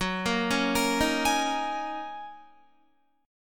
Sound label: Gb 6th Add 9th Gb down Guitar; Listen to Gb6add9 arpeggiated